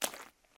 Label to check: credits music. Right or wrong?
Wrong